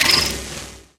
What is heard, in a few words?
object use no gun